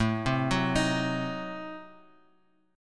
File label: A Diminished 7th A down Bass Standard; Adim7 arpeggiated; JGuitar